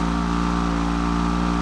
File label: Engine